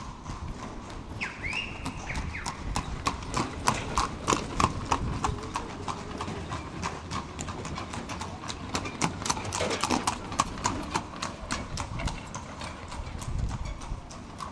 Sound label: horse drawn carriages trotting down the siq at petra